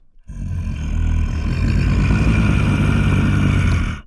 Monster Growl

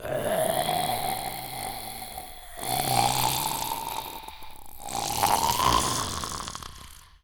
Zombie

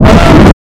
Jumpscare

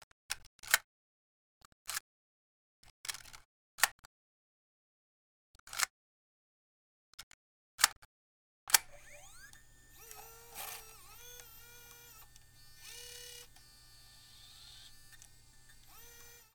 Mini Dv Camera

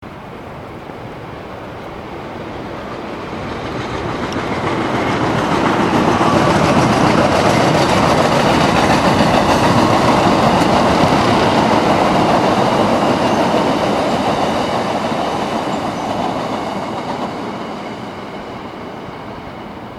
Passing L Train

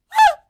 Shout

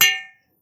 Metal Hit

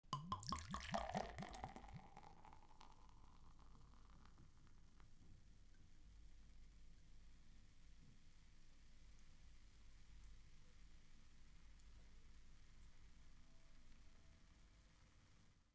Beer Spilling

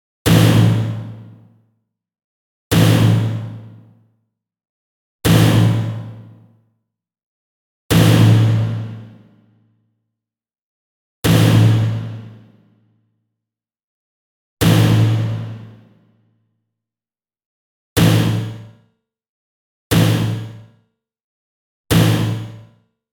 Metal Door Close; Tomlija

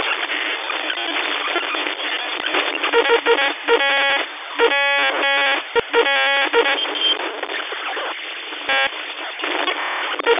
Glitch Sound

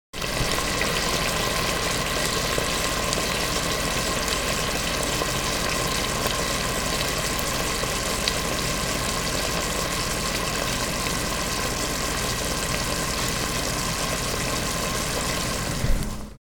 Chicken Sizzling In A Pot

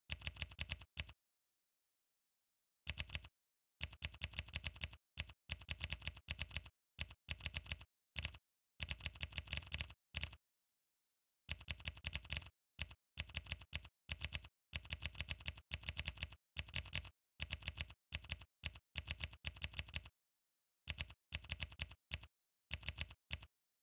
Phone Typing Texting

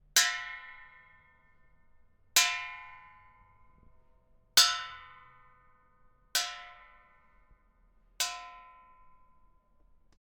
Copper Wire Tapping Brass Pot

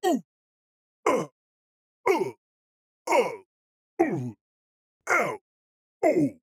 Big Character Grunts

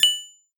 UI Select Enter

